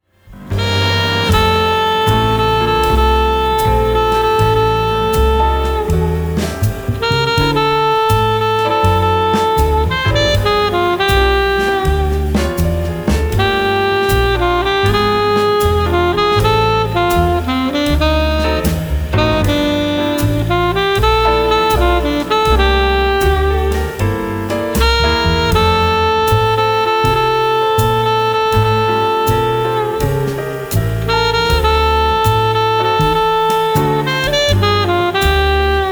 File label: Hilltop Blues